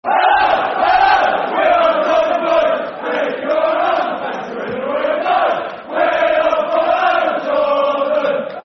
Football Chants (Soccer Song); Hello, Hello We Are The Tottenham Boys; Tottenham Hotspur Thfc

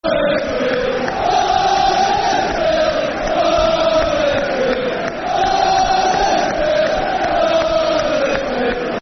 Football Chant; Huddersfield (Slower); Huddersfield Town Htfc

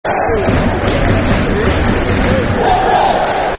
City (Clap), Football Chants; Birmingham City Bcfc

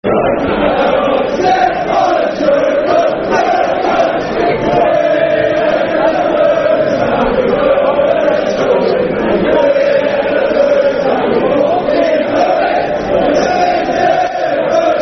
Football Chant; My Old Man; Aston Villa Avfc

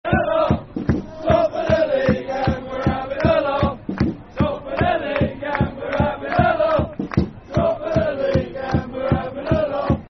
Football Chant (Soccer Song); Top Of The League And We're Having A Laugh; Milton Keynes Dons Mkdfc

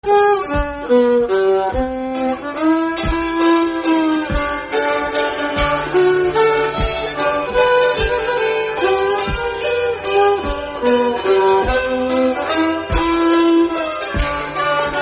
Waltz G; Nameless Waltz

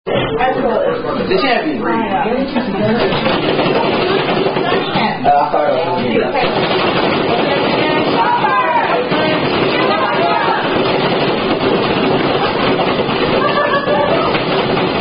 Thumper, Non-Drinking Game

